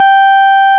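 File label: RMI piano G 4, EP; Sonic Reality - Vintage Timetraveler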